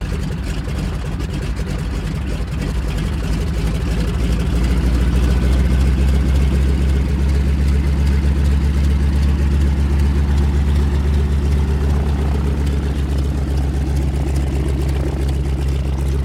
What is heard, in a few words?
Bi Plane Idl Rev Take O